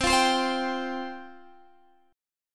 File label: C Suspended 4th C, Guitar, Open D